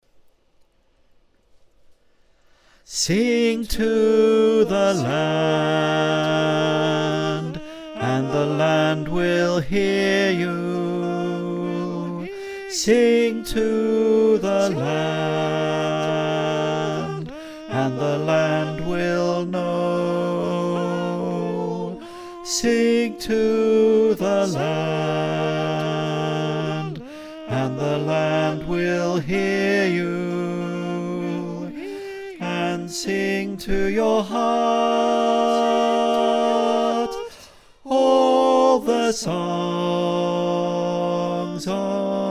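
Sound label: bass, choir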